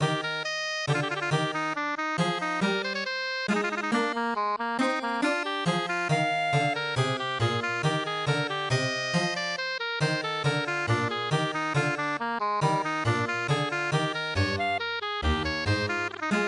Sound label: MIDI